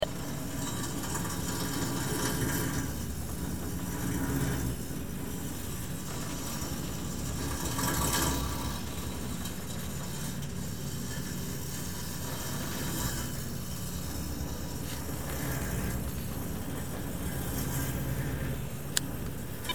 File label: Needle Gun